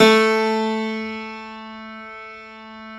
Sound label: Classical Piano ff A1; Classical Piano ff -ped; Total Piano; Brilliand Classical Piano